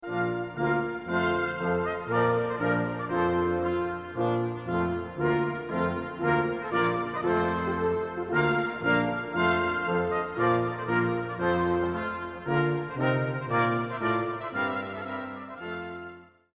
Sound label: trumpet tune